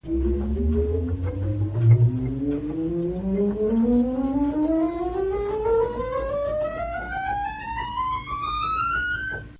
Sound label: salicional, organ